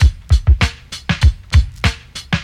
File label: 130 bpm drum groove C# key, Free drum loop - kick tuned to the C# note